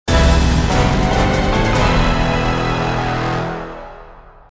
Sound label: Ambush win